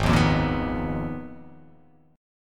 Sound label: G sharp Minor 6th Add 9th